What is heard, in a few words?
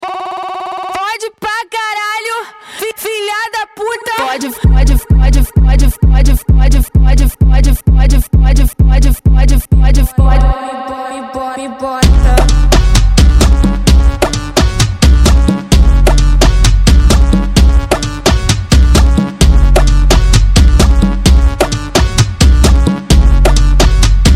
Savage Funk